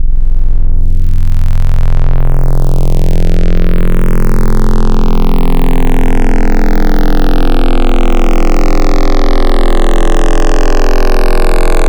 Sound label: Vocal FM, wavetables; Cymatics; Essentials Wavetables; Serum